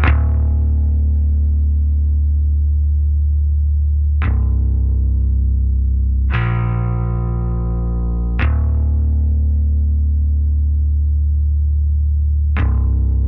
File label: Instrumental Bass; nowhere station